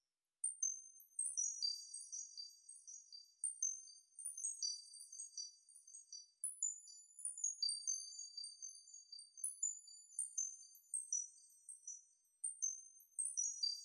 Score Loop; pac man hats 2 android ios mobile gamerip 2017

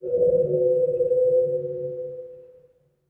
CHIMES SOFT STEEL FLUTED CHIME; magic scroll tactics; ps4

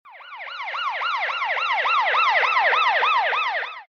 Police Siren; City

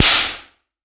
slap

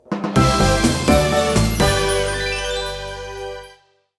Success; SFX Battle Ingame

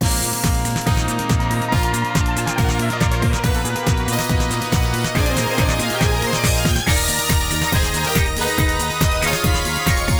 Wining Run

